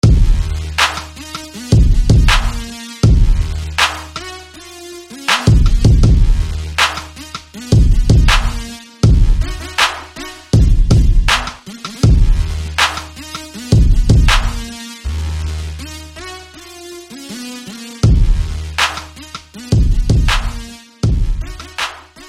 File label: Synth; Bangin; SFB FX